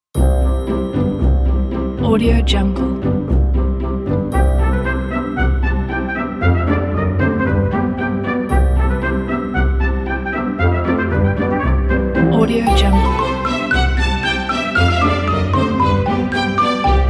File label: cheerful cartoon pack